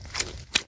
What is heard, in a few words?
step